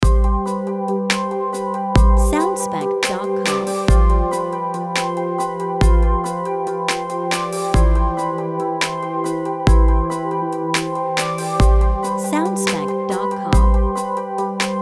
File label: 9-8 Loop Theme